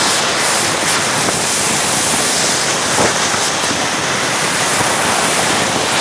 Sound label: Luminous Urn Loop; moul